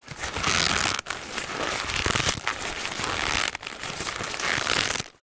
foley paper sheets lots scrunch up squeeze multiple times; zapsplat